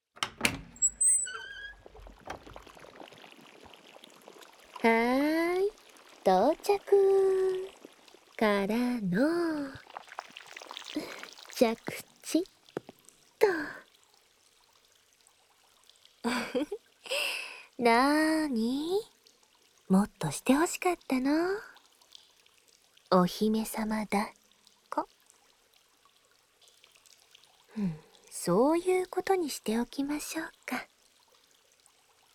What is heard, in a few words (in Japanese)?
ぐちょぐちょぬるぬるで癒やしタイム